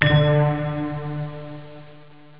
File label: pizz; jazz jackrabbit 2